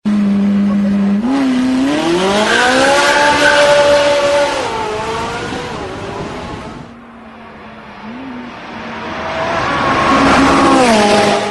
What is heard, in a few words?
Ferrari 458 Italia